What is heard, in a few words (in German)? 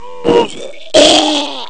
kotzen; Amiga Soundtracker Sample Packs